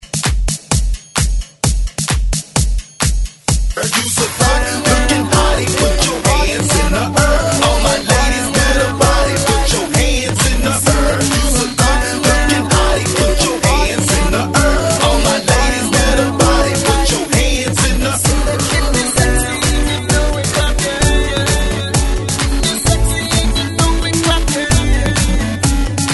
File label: Hype Re Drum 130Bpm; DJDOLLS